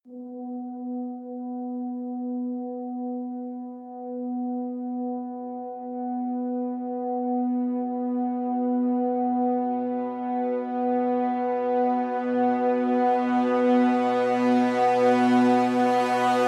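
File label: crescendo brass swell hi B; brand x music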